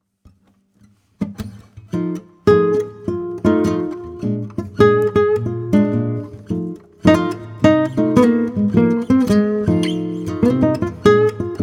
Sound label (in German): Gitarre; Hörbeispiel 6 - Improvisation (Gitarre)